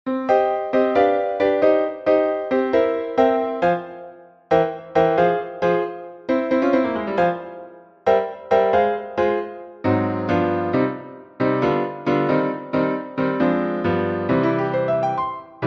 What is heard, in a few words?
piano accompaniment; audition